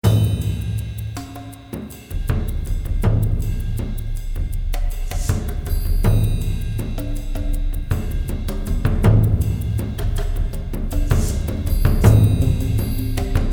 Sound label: Florida Springs music cue, No Gtr